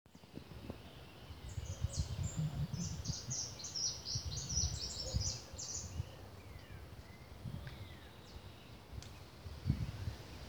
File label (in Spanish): jilguero; Jilguero Dorado (Sicalis flaveola); Sicalis flaveola pelzelni